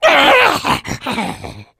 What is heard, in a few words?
jockey death, voice; Left 4 Dead 2